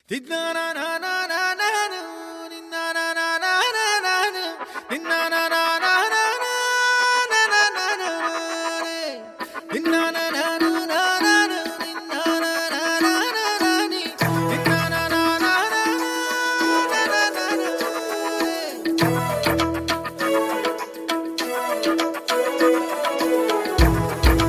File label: Cool Tone